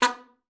SLPB B3, ALTOSAX; Xsample VOL-2

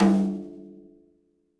TD-20 Tom A, Roland TD-20 Toms

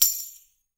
TAM, NYC Percussionworks

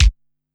Kick, Korg Wavestation SR

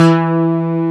FM Synth Bass, Roland

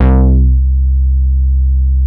DETUNE BASS, VINTAGE VOLTAGE; Masterbits - Vintage Voltage; VV BASSES

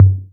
202 tom, Drums, Boss DR-202

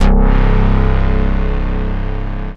Bass Note C

